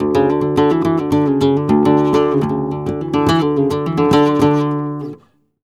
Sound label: Remates De Pulgar, WAV Guitar, Flamenco; ProSamples vol.46 - Flamenco